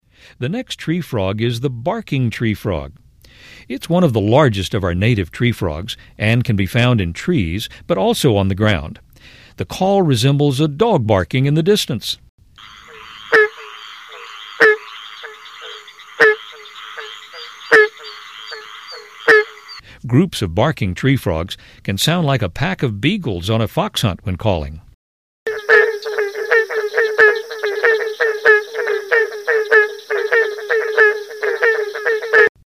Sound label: barking tree frog